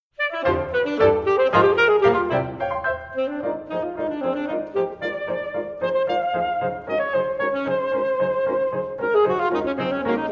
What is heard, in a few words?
Sax Sonata